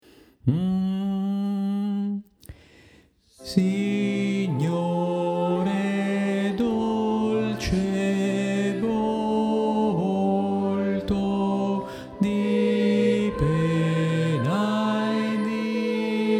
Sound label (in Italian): tenori